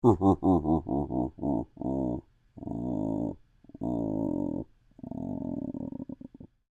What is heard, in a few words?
Creature Devilish Laugh Lite, Human; Horror; BigRoomSound